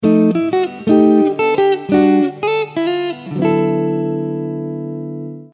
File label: Jazz JCM900